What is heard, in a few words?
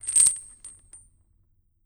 Damned Tourment Chains